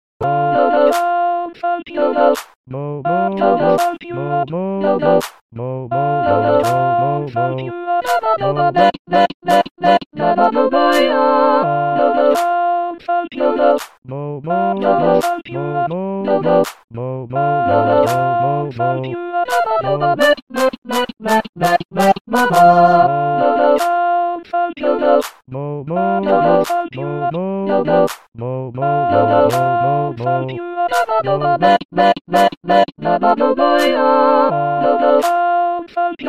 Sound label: all last 15 bars slowed down